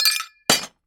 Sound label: Scrap And Fall